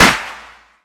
clap sound c key, Royality free clap sample tuned to the C note. Loudest frequency: 2343Hz; percussion; single hits one shots